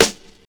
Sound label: SNR, Snares